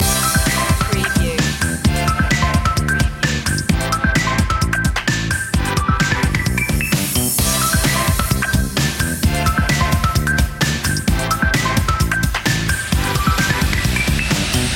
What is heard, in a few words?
electro cool loop; media music now